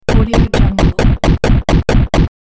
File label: game ladder climb up